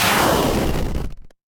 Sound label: retro game explosion